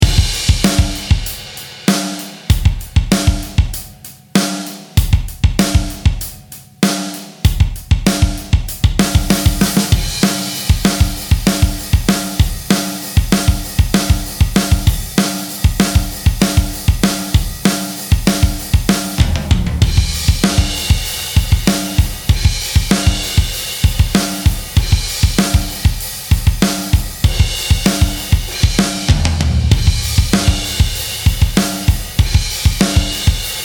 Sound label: lowcut drums